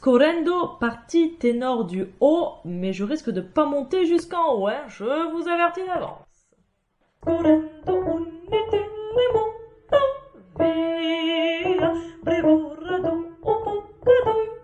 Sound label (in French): Tenor Haut; Scorrendo; Rigoletto; Verdi